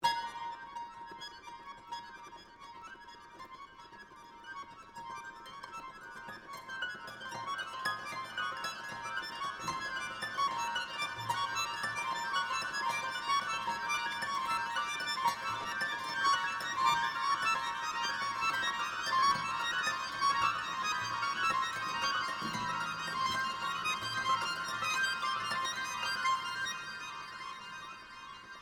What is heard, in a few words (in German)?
harfe; entente